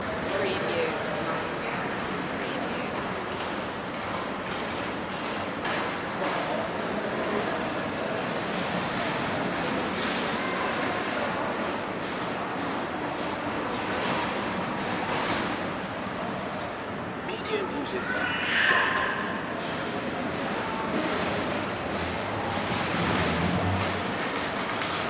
shopping mall hall loop

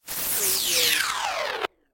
Descend Hit

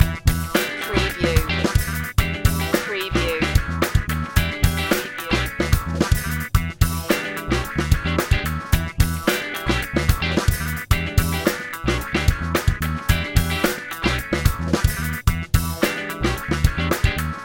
cadillac loop

